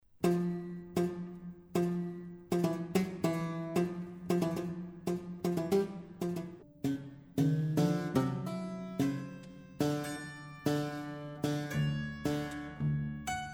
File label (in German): Klavierquartett